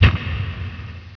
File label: echo, cave